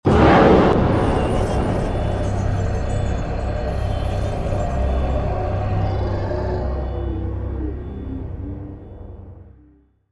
Horror; SCP 205

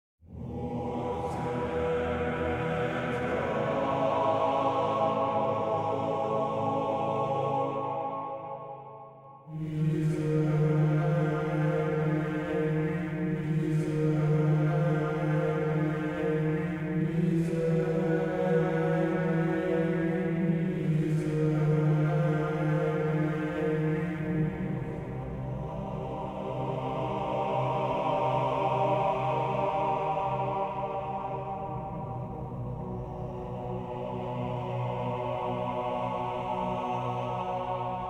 Choir

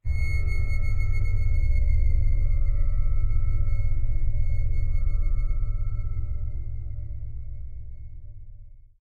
To Zone, Ambient; scpcb-godot

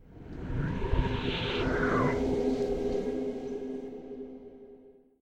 Ambient; Forest

